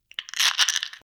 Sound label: Ice cubes in drink